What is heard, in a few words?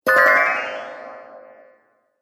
Fairy Casts Spell, magic; Magic Sound Effects